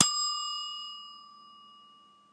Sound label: kitchen timer ding; zpizmak